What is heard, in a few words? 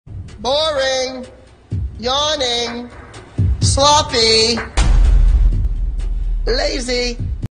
boring yawning sloppy lazy